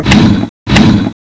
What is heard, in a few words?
attack bite; cstrike; Boss Angra Reks; angra zbs